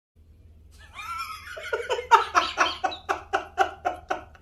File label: Laughing; Puneet Superstar; Red Face with Agarbatti